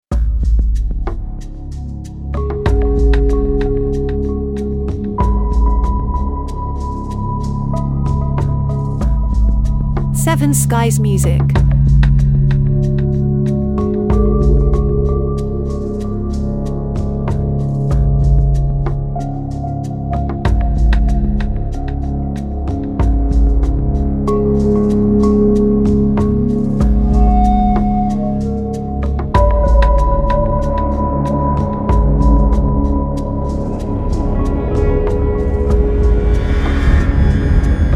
Big Hollywood Dramatic Score

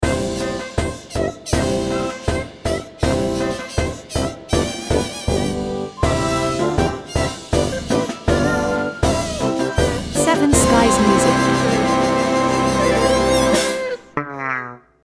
Marching

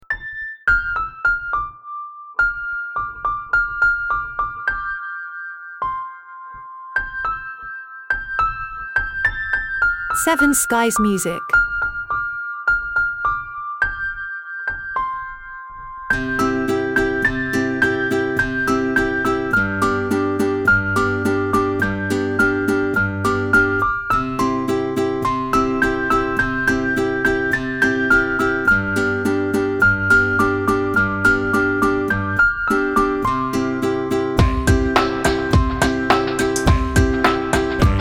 Little piano motive

